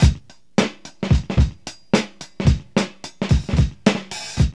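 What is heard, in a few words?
110 bpm, Funky loops; Zero-G; Funky Elements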